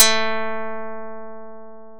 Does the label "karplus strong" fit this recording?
Yes